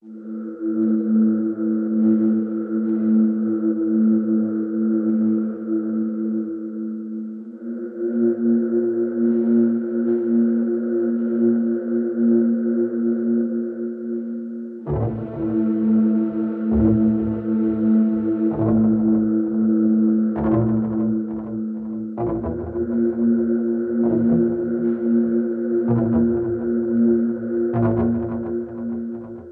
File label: Ambient